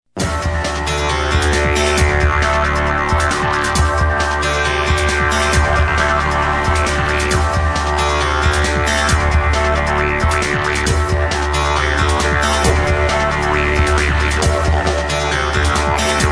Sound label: Overdrive